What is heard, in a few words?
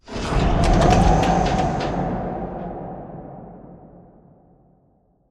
Giant Floor Panel Exit On Track Start; Boomtown; Sideways Town; Titanfall 2